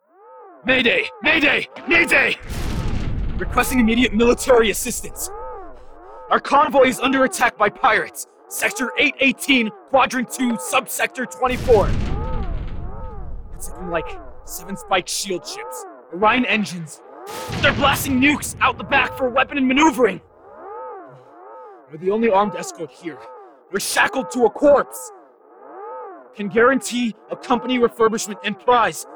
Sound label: Distress Signal